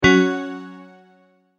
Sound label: multimedia tone exclamation; McKinney Sound